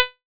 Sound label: comb